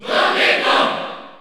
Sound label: Cheer French; Donkey Kong; SSBU